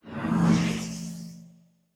Teleport, Sci-Fi Sounds

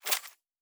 Weapon Foley; Sci-Fi Sounds

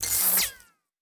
Glitch, Electric; Sci-Fi Sounds

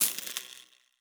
Spark, Electric

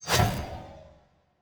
Complex Interface, Sci-Fi Sounds

